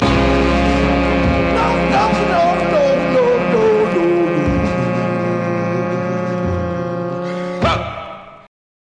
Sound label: downshift stabs and vocal; SAMPLED MUSIC